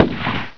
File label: Big Tank SHOT; Tank Wars 2.0